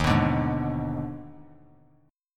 D sharp Major 7th Suspended 4th Sharp 5th; strummed; JGuitar

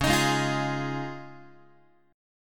C sharp Major Add 9th, Guitar; C#add9 strummed; JGuitar

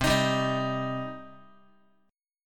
C Minor C down Guitar; strummed; JGuitar

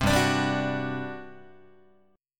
G Major 7th Suspended 4th, Guitar; GM7sus4 strummed; JGuitar

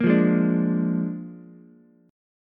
Bb 7th Bb down Ukulele; strummed; JGuitar

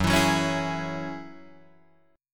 Gb 7th Suspended 2nd Gb Guitar DADGAD; Gb7sus2; strummed

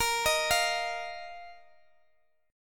Bb Suspended 4th Bb down Guitar Open D

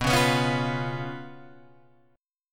B Suspended 2nd Flat 5th, Guitar, Open G

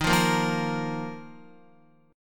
Eb Suspended 2nd Guitar, chord; Ebsus2 strummed; JGuitar

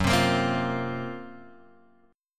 F sharp Major 7th Flat 5th; F#M7b5 Chord {2 3 X 3 1 1} strummed

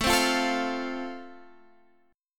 A Minor Sharp 5th A; Am#5; strummed; chordshape; x,12,10,10,10,13

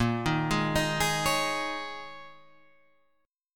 D sharp 7th Suspended 4th A sharp, Guitar

